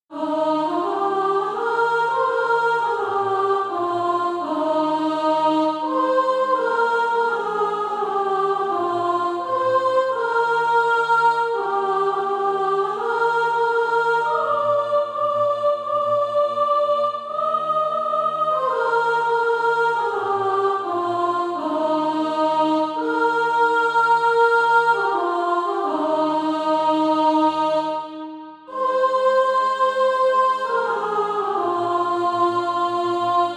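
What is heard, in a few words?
soprano, SATB Practise Music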